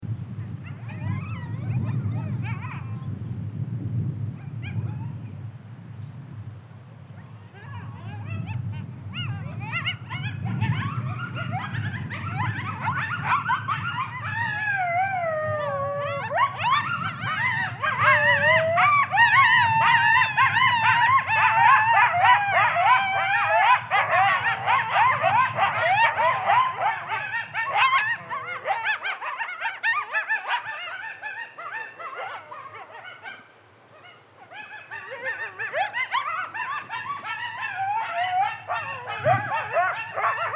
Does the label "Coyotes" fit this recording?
Yes